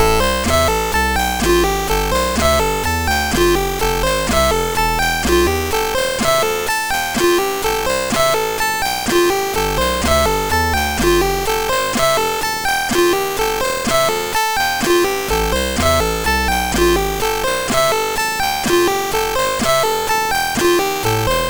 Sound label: MOS6581R2, 8BitChiptune